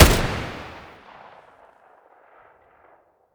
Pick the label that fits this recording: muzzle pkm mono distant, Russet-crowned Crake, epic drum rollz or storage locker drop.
muzzle pkm mono distant